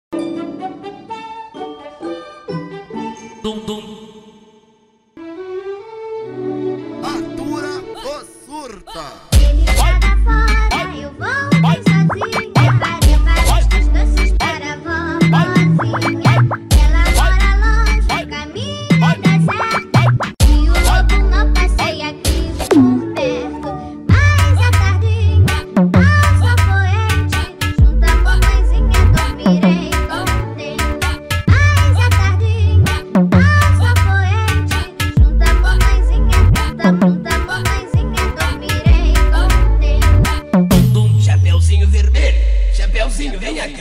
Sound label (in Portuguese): VERSÃO FUNK! [REMIX FUNK]